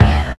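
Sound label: vocal kick, Linndrum 9000; Greytsounds VOL-1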